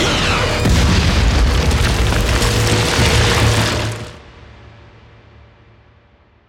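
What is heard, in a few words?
Explosion Huge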